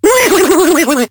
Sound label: pain; Awesomenauts